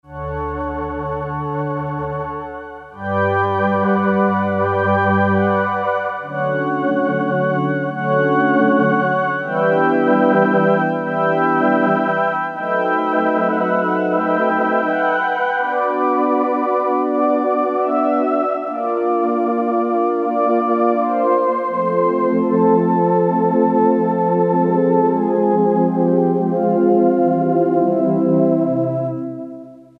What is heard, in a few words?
Yamaha PSR-300 CHORUS; Voices